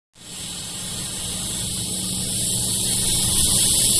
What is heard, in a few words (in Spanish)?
disco elevador